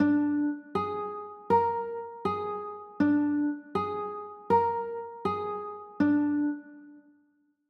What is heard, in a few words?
b flat 7, chords